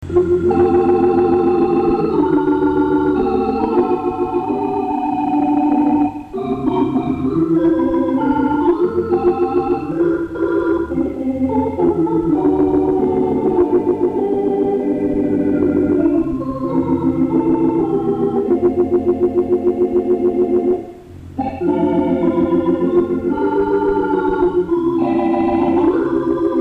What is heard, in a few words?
Organ